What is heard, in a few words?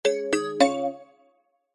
multimedia alert mallet and chime success